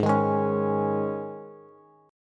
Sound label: G sharp Suspended 4th G sharp, Bass; G#sus4 strummed; 16,18,18,18; JGuitar